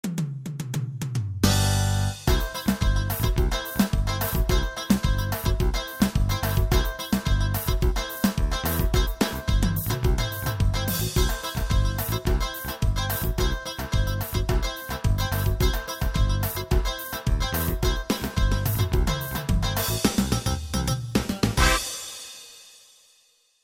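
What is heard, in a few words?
C major, Latin; gw-7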